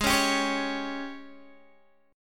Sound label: G sharp Major 7th Suspended 4th, Guitar, DADGAD; 18,16,17,18,16,x